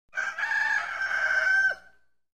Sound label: rooster